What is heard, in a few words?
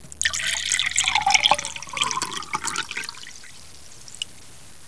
water poured into a glass